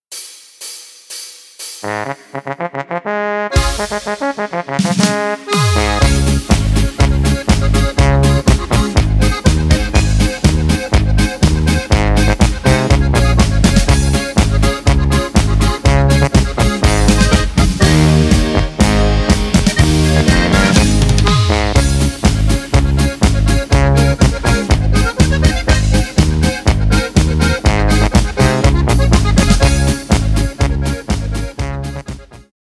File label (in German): Marsch, ohne Chor